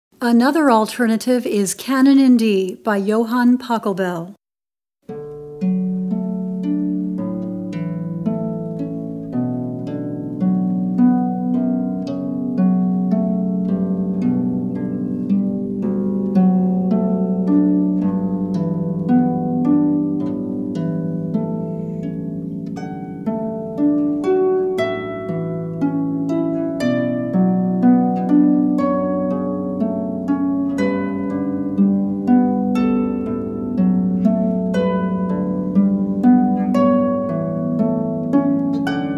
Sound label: harp